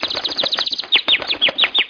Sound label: SKY BIRD SMALL; Frogger 1997